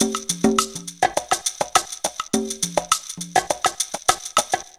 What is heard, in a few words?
PERC LP, PERC LOOPS; Zero-G; Funky Elements